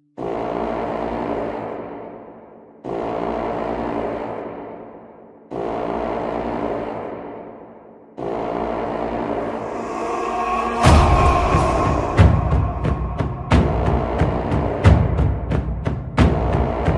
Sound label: Viking Horn Ringtone and Sound